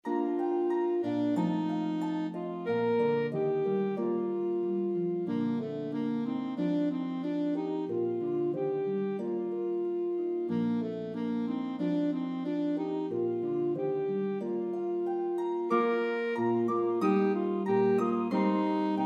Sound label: Harp Melody Instrument Alto Saxophone